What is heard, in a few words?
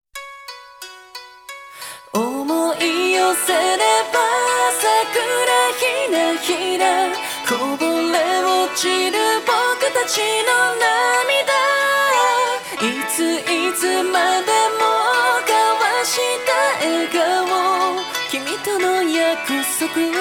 Acapella; Yorinuki Gintama-san